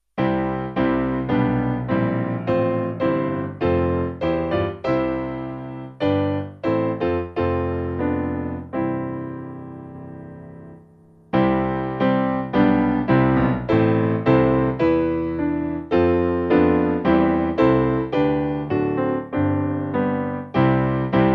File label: Piano